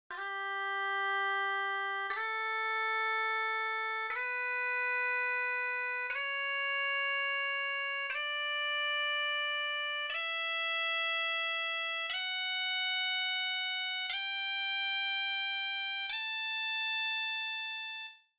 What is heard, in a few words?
bagpipe